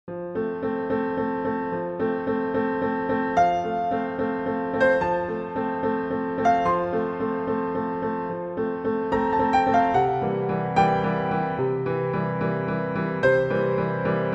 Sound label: Piano Concerto; Piano Solo; Classical; Piano Solo - Intermediate